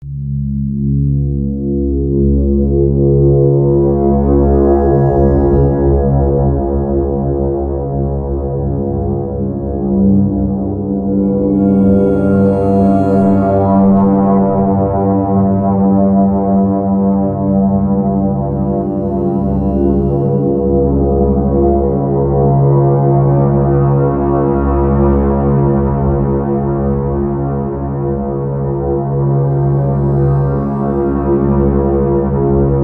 Alien Drone

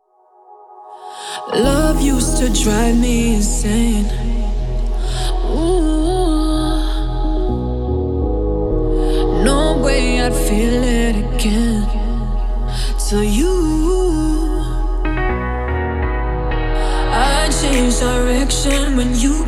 DEEP HOUSE

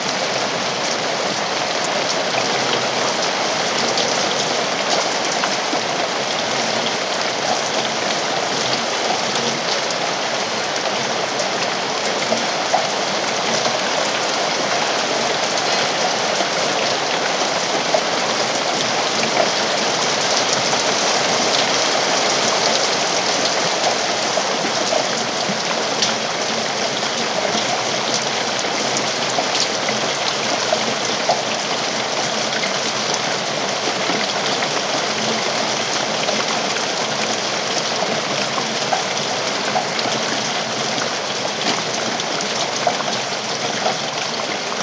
Pink Noise White Noise Rain

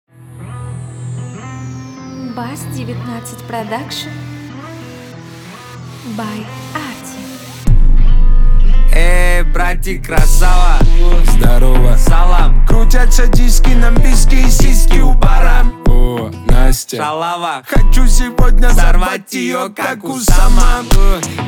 26Hz Bass

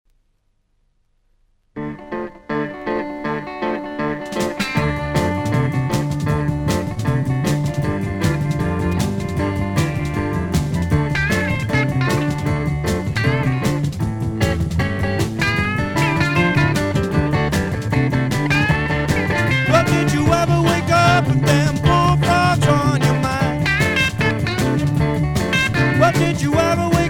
Blues

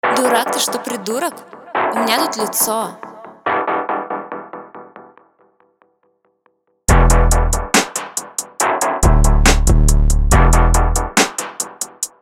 synthCORE

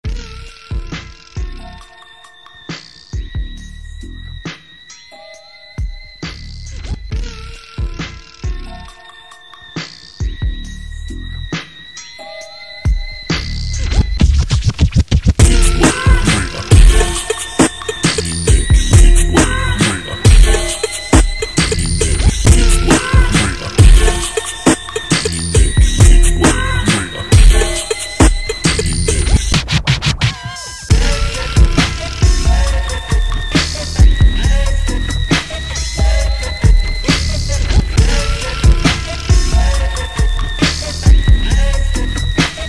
SCRATCH

